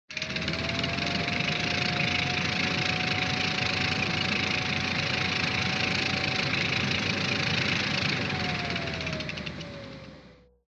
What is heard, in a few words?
Retro Anime Caterpillar Tread High